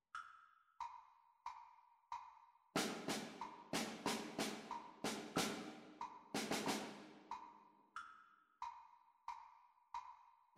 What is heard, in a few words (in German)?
Rhythmus nachklopfen; Unterricht; Rhythmus nachklopfen und aufschreiben